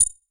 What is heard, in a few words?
Hat